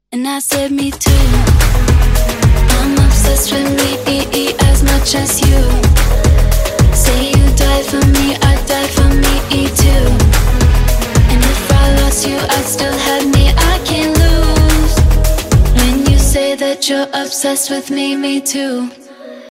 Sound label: english ringtone